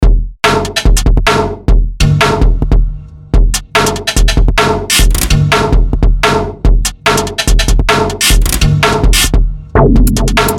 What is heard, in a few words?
Inside The Kick and Snare Kit; Soniccouture; Konkrete